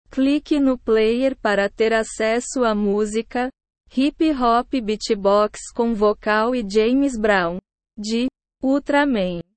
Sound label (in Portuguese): hip hop beatbox com vocal